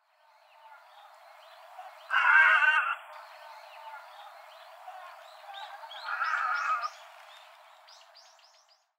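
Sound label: kosagi, Little Egret; koe; himeji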